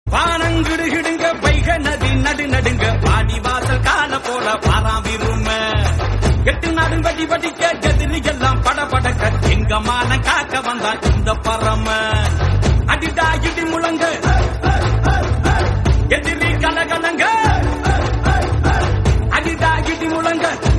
Tamil Song Ringtone; Viruman

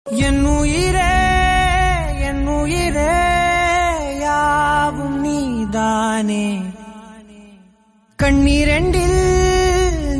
Tamil Song

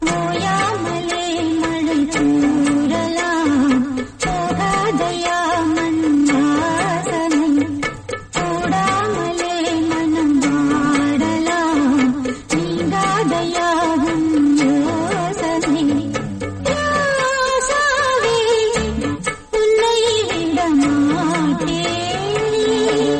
Tamil Song

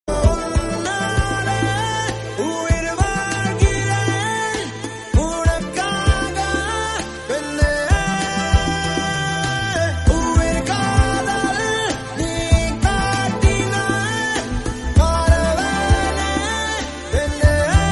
Tamil Song

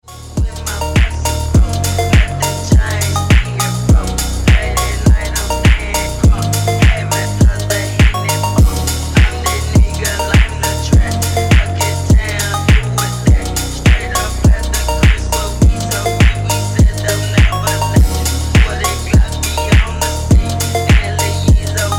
Slowed; Ringtone

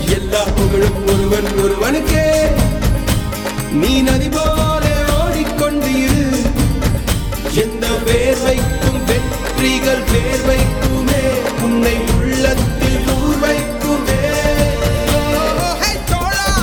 Tamil

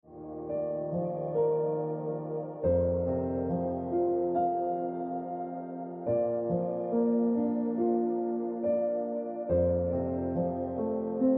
Tonic Note Piano Music

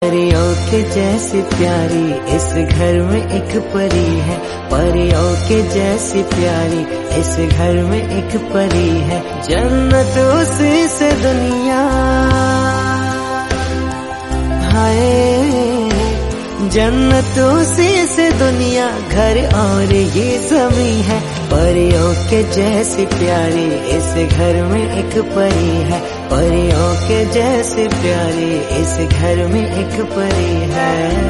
Song Hindi

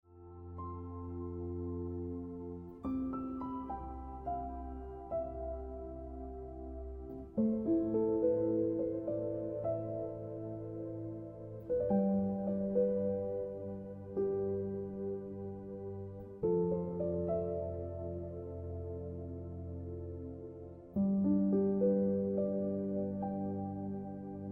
Piano Music

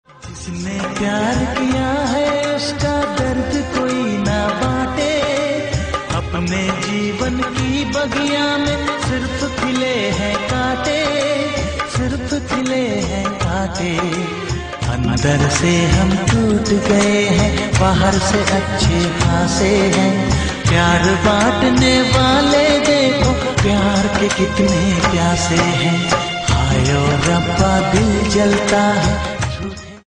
Hindi Sad

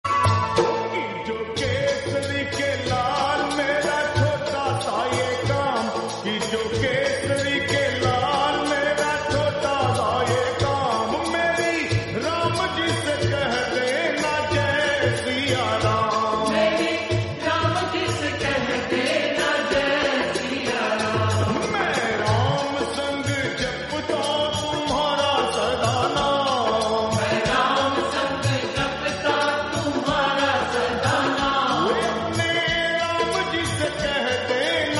Slowed Reverb Hindi

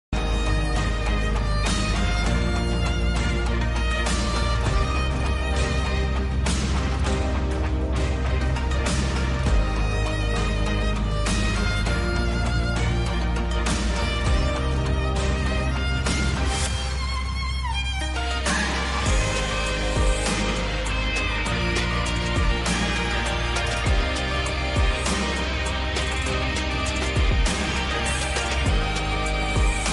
Intrumental

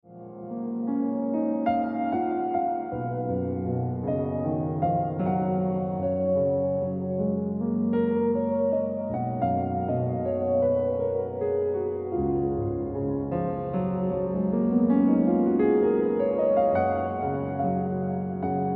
Piano Music

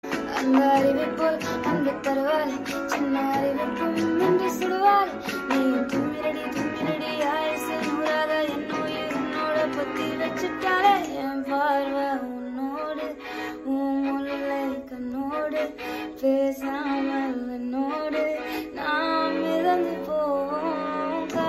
Female Version; Ringtone

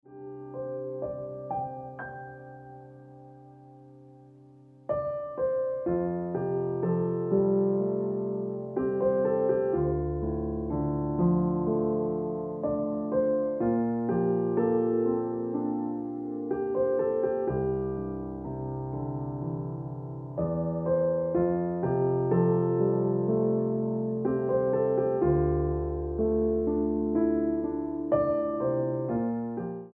Piano Music

